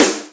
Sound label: SNR GATE